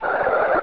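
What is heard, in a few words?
zombie alert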